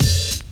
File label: KIT, Dance Mega Drums, HIP; Best Service